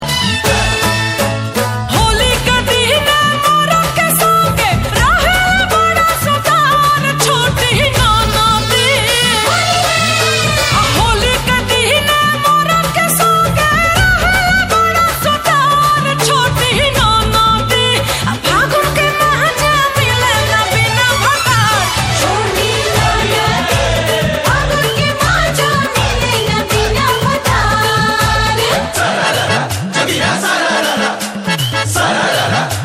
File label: holi song